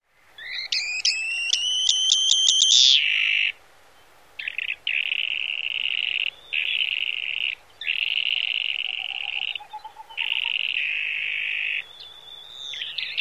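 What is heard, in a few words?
song and calls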